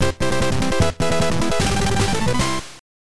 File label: Battle Win; bomberman 94; turbografx 16; pc engine